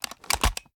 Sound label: raise up; reloads; iw8; uzulu; gmod